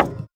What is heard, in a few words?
Moody Park Playground Perc; Houston; percussion